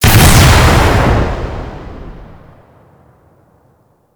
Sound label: Frozen Barrel Explosion; Warframe